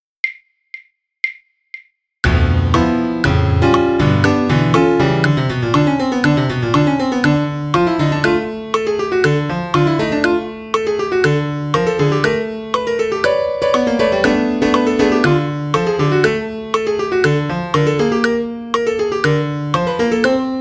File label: train piano click